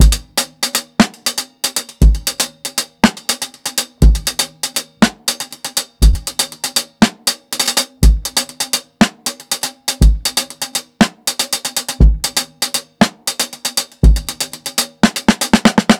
120 HALFTIME, drums; LOOPS; Basement drums vol 2

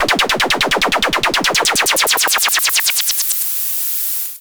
Yamaha CS5, Filtered Noise

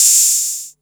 Open hat, retro house samples, Drum Hits

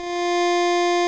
Beep; Bitkits; Blip & Blop

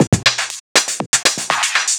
Filtered Beat, Trance; Sonic Foundry (Sony Creative Software); Trance NRG VOL-2; Drums

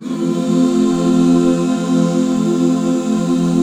F MAJ; Best Service Gigapack 1

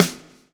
lash, backbeat

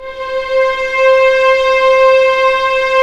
STR, Vlns Orch; Roland L-CD702

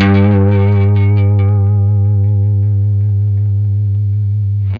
BLU LONG VIB, Guitar; 90 s Sample CDs; Quantum Leap - Guitar & Bass

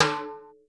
TIMB; Crypton - DrumBase5000; R70 KIT #1

